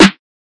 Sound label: Old Snare; drums; hip hop; STYLE KITS (Hip Hop, Etc); Khezie Hip Hop Kit